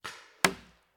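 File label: Paintball Gun Impact; Sound Ideas; Guns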